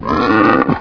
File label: pain, zombie; svencoop; hl2